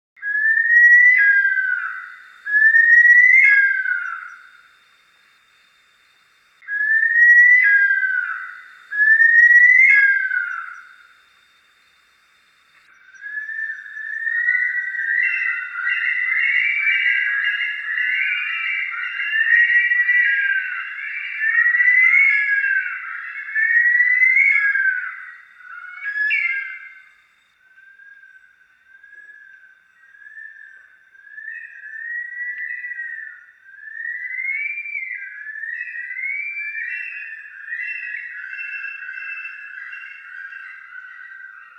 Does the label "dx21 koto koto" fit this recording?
No